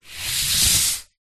household brush scrub tile wall clean single long scrub